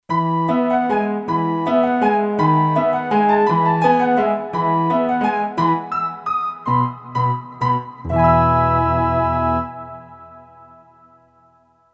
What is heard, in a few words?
Grand, Harp & Lead, Kurzweil K2500X; Factory Programs